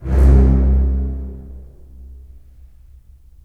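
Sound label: STR, strings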